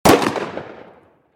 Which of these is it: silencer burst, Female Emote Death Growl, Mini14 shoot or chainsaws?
Mini14 shoot